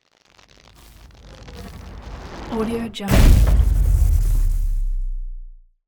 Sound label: electricity heavy shockwave